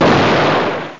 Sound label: shotgun